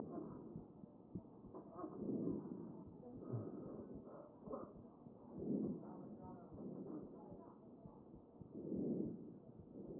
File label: A CHEST